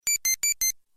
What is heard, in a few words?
sms ringtone; snow bros